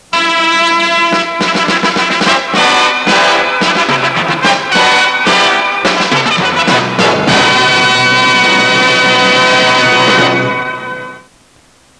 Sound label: dramatic